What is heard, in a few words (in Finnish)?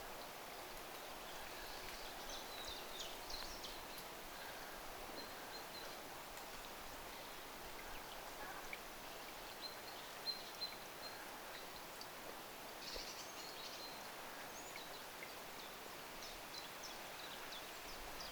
pidemmasti mahd viy-tiltaltin laulua lauluharjoittelua nuoren ilm